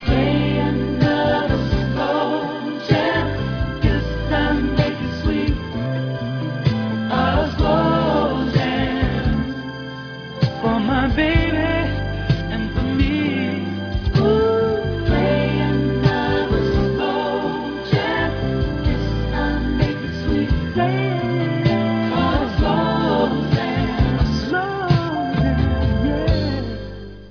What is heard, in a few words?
slow jam